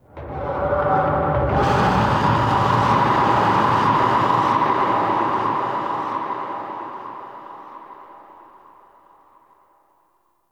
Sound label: Sentient Vessel Delay Scream; Warframe; Tau